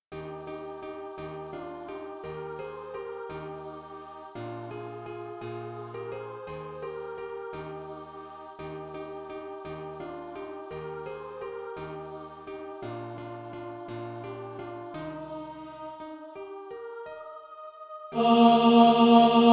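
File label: tenor, Practise Music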